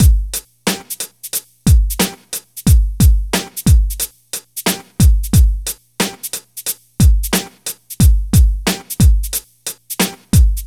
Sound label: drm 90, Hammond